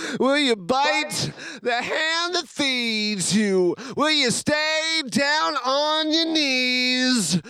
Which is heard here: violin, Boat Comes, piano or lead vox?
lead vox